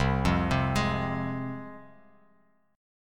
C Suspended 4th Sharp 5th, C, down, Bass Standard; Csus4#5 arpeggiated; JGuitar

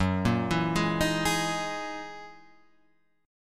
F sharp 13th F sharp; arpeggiated; JGuitar

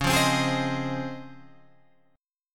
C sharp Major 9th, Guitar, Open G

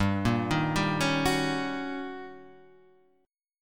D sharp 7th Sharp 9th

